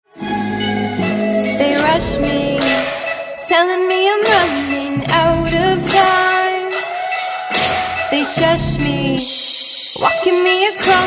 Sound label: Dance Sample